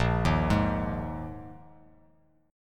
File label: A sharp 7th Suspended 4th A sharp; arpeggiated; JGuitar